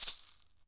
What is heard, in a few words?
PUILI, HAWAII PERC